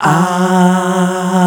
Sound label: F#2, Soul Aahs